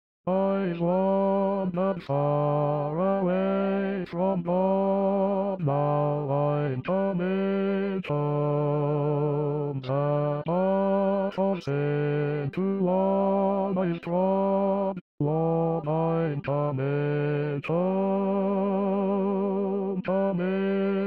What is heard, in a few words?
Lord I'm Coming Home Basses